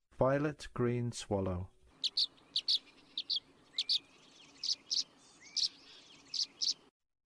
Violet-Green Swallow, Songs of Birds of North America; Western USA; Western USA Songbirds